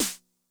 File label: SNARE DRUM Med Tune Med Tone, 909; classic machines; 909mod